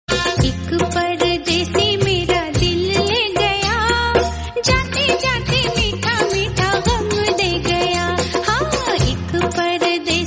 old classical

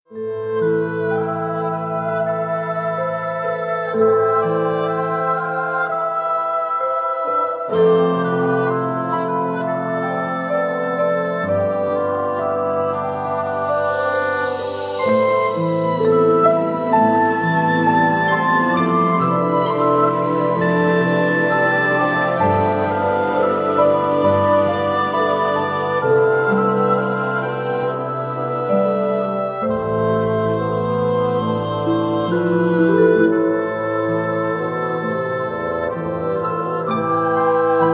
romantic

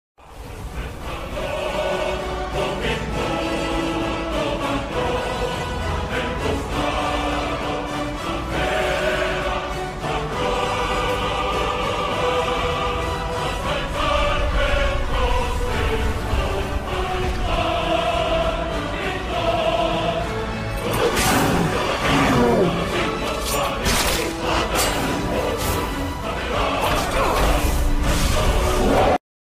ringtone; skyrim

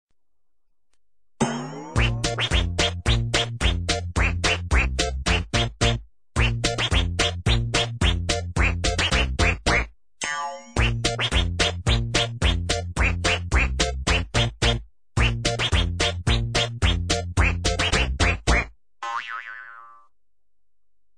little duck

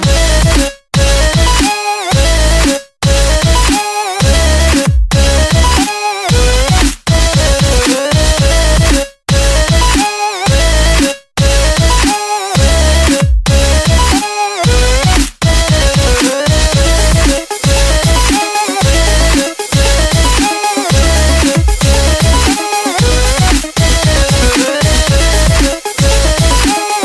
undertale asgore remix

